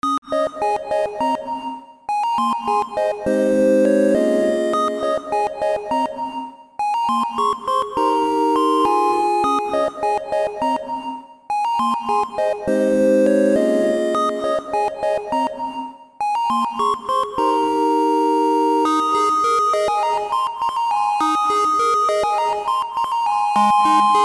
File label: snow